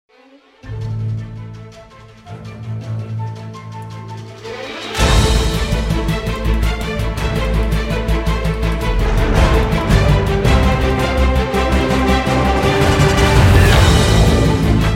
Cannon in D Minor

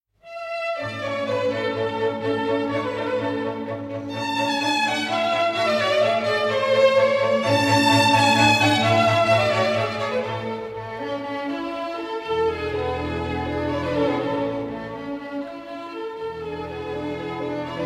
Harp Concerto in A Major Allegro Molto

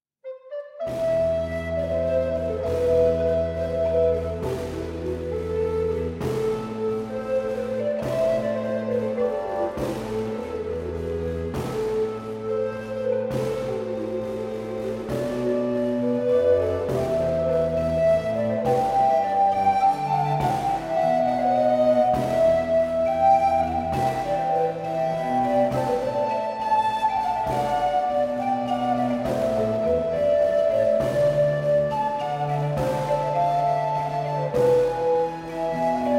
Bassa danza 'alta'

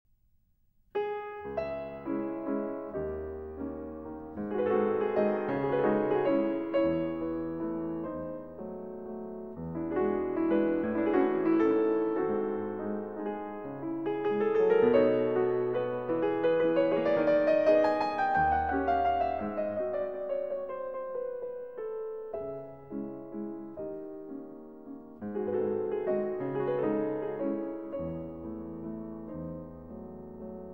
Waltzes, Tempo Giusto in C-Sharp Minor

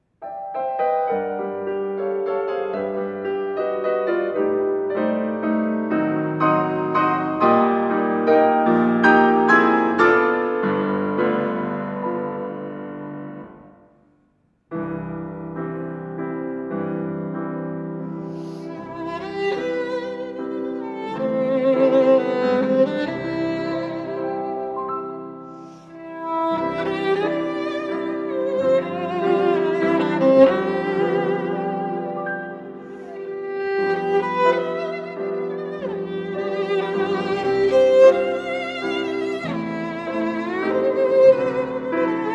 Violin and Piano; Der Opernball Midnight Bells; Arr. Kreisler

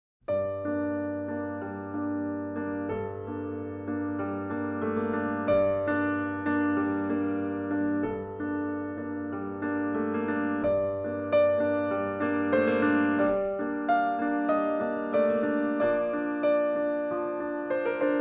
Piano Interpretation

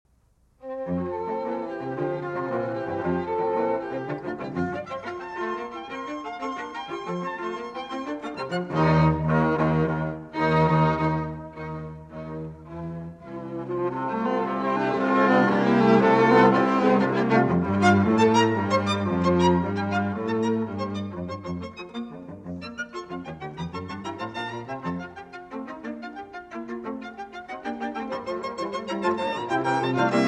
String Quartet in F Major, Vivace

